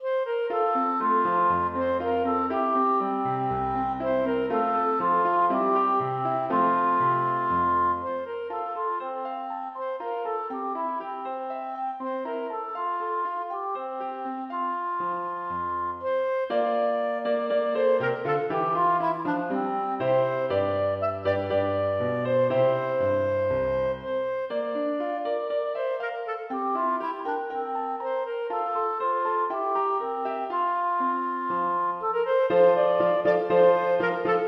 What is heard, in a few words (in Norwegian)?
Allemand for klarinett og piano